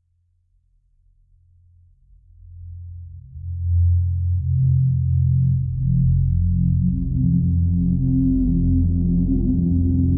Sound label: Pad F 80 Bpm; MIDI Clips; Instruments; Burnt Sun; Ableton; Drone Lab